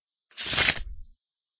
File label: send card